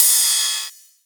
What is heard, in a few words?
Ride, Cymbals; Vengeance Samples